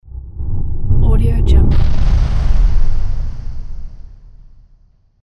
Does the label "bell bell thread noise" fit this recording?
No